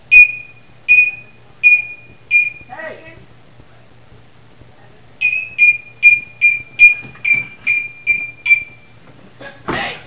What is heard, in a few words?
evil chirp lofi